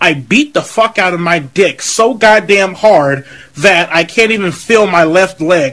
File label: beat